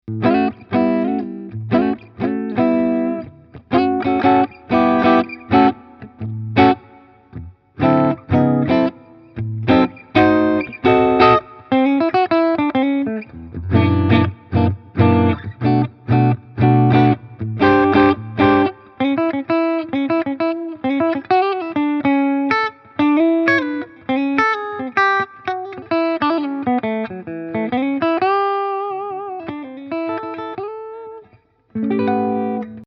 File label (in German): Harley Benton SC Custom III Active Mitte clean